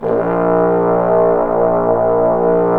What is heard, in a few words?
BRS BSTBN, Bs.Bone Sect; Roland LCDP06 Brass Sections; Bs.Trombones